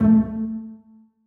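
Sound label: basses pizzicato a1; Sonatina Symphonic Orchestra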